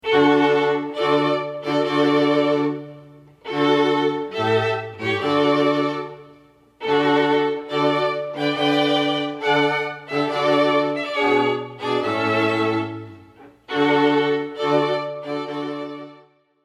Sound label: Wagner March